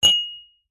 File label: household glass champagne flute clink on solid surface